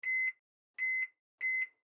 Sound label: microwave beep